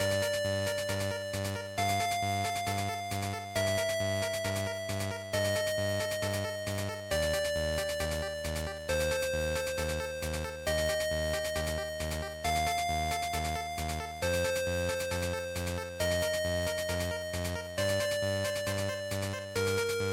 Shooter Title Loop, bgm

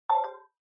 Smartphone UI Sound Speaker

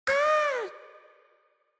Parody Accent Reverb

